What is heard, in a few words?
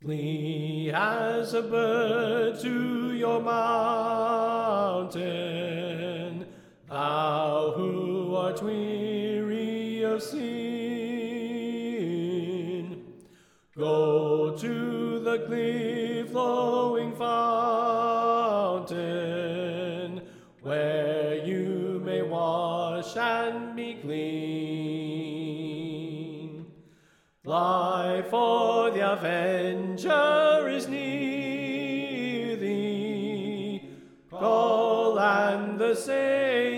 Soprano Left